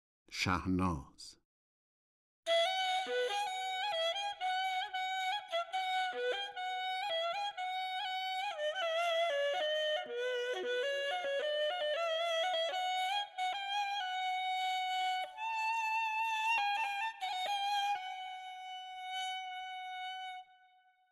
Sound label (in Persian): Shahnaz Shur Radif-e Ney, Dastgah Shur